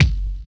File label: HI RIDER; Spectrasonics; Metamorphosis